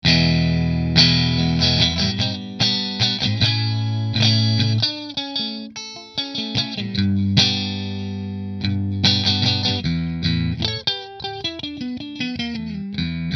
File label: Position 3 Fender; Showmaster; Position 2; Fender Custom Shop Showmaster